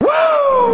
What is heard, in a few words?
wooo; trg bronx